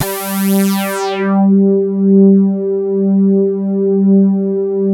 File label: reso pad; Sound Gallery - Charged